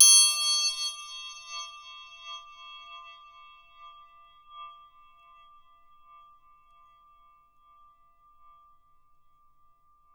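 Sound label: SM TRI PAN right, percussion; Miroslav Vitous - Percussion & Harp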